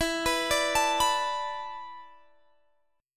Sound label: B Minor 7th E, chord; Bm7/E arpeggiated